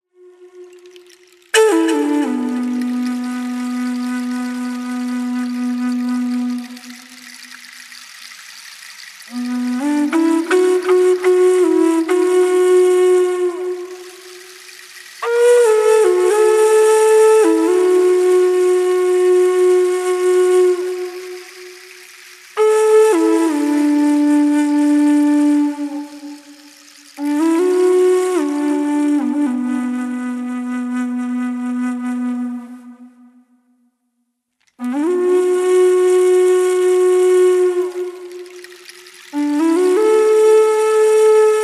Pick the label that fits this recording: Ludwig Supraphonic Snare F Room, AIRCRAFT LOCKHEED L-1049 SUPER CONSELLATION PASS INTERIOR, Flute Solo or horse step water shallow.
Flute Solo